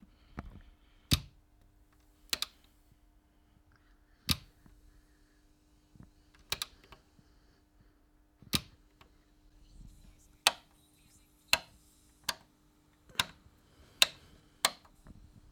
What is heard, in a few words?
Old switches